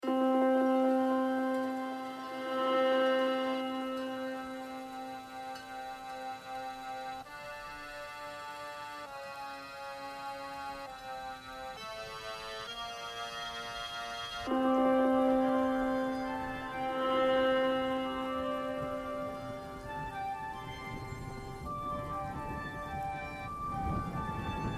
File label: rain atmosphere emotional storytelling lofi beat; onesevenbeatxs